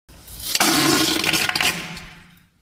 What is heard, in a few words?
zhestkii fart